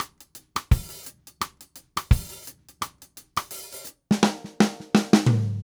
Reggae Fill, Drumset; Sonic Foundry (Sony Creative Software); Joe Vitale Latin Percussion

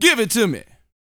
VOCAL, R&B Dance, HIP-HOP

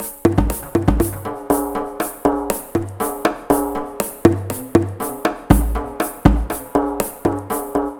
Conga Loop, Ethnic Instruments

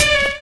SLOW; Univers Sons

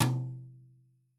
Toms, Yamaha RY20

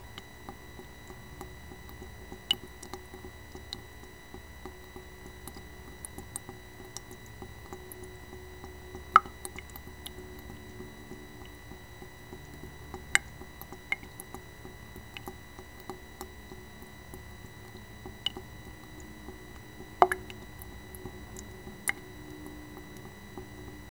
WATER, Swamp Atmosp; Roland - LA Composer vol. 2

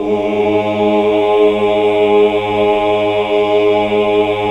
OOH, Hallelujah

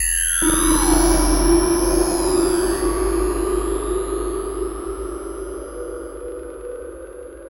Long Decay, FX

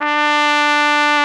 BRS CORNET; Roland L-CD702; Orch.Ensembl

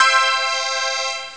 string; Amiga Soundtracker Sample Packs

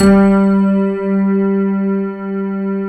PNO HEAVN; EDENPNO

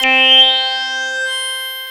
SYN DIG, Roland, digital; Sound & Vision - Gigapack I CD 2

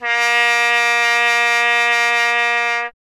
Trumpet A#0; Miroslav Vitous - Solo Instruments VOL-1

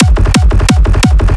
Kick Bass F

